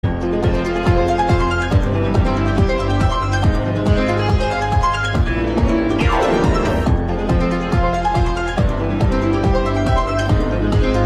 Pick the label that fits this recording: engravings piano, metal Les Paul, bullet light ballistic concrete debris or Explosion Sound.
engravings piano